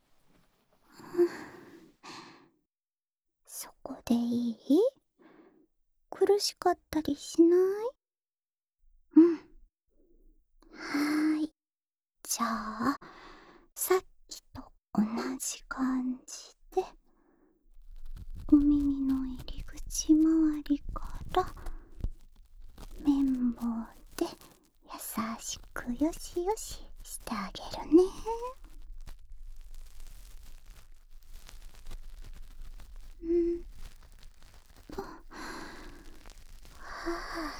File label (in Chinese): 另一邊的耳朵也、注入愛情的打掃乾淨。（濕綿棒）（左耳）, ASMR